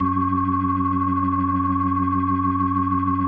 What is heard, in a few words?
F#2F, B3, Vintage Keyboards